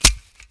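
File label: grass; Mafie 1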